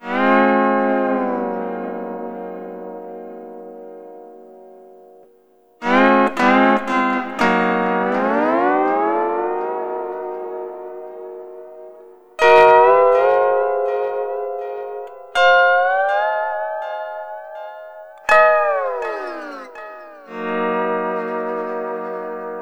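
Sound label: Sunrise Guitar Chord A#, Lounge; Zero-G Kocktail Kollection; Construction Kitz (Lounge); Sunrise Mixes 082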